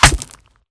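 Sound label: Bullet Light Ballistic Concrete impact; Titanfall 2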